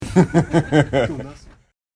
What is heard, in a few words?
hahaha